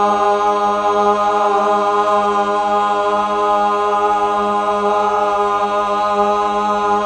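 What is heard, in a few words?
Fem G sharp 3, Mellotron Female Choir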